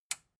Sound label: metal button tiny push disable